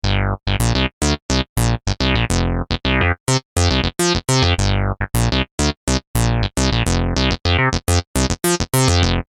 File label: Reso Syn Bs; fantom-x8; patch demo